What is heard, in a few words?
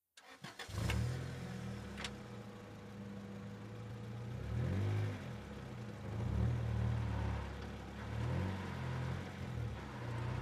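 Integra Start Shift, Acura Integra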